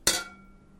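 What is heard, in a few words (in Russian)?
металл удар высокий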